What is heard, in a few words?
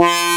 ppg pul-sweep, wavetables